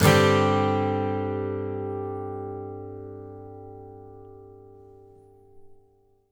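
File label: acoustic guitar G sus down, Whole Lotta Country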